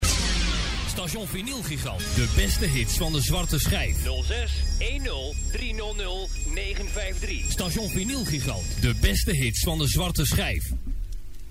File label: Jingle; Vinyl Gigant; Audio Fragmenten; Arkan Radio 2